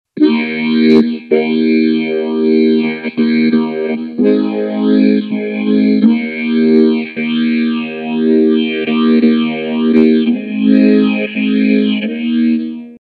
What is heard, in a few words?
Chorus Phase Flange Detune; DigitechRP; RP200